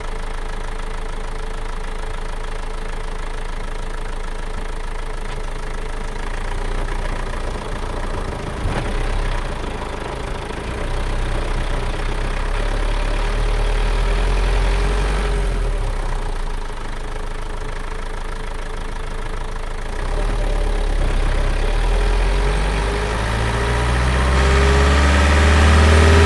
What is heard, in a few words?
renault master; sonniss gdc